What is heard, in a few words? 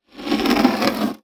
Impact PVC Pipe Dragged Across Wood Floor